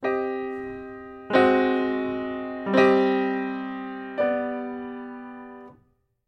Min slow

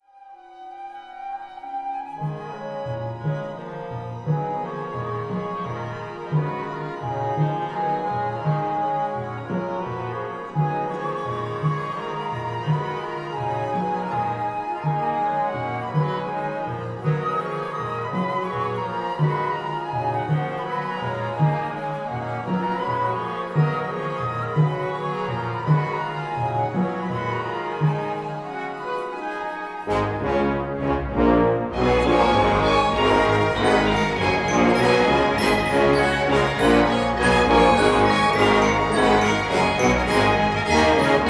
Flute

